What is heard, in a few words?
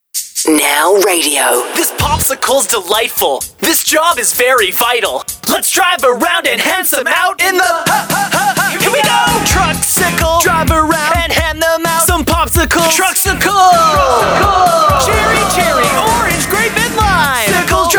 Song; Contests & Promotions